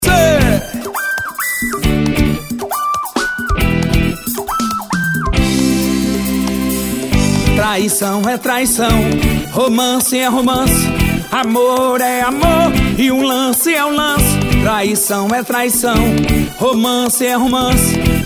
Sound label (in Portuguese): tambor e um pente